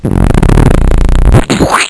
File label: fart splat